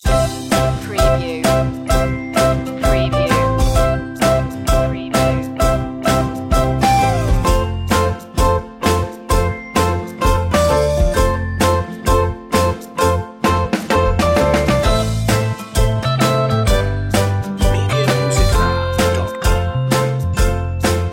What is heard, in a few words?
the ukelele commercial; 30sec